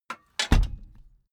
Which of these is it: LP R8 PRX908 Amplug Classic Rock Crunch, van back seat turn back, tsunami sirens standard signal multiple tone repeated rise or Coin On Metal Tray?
van back seat turn back